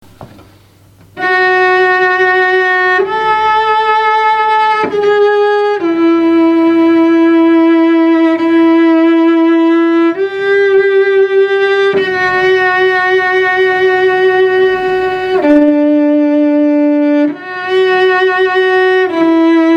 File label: slow theme on the cello; sherlock